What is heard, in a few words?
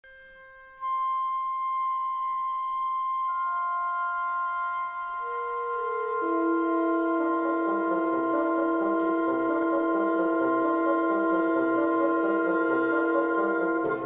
Piano concerto; McCabe